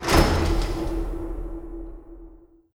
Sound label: Gate Close